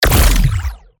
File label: alien shoot; Asteroids-2026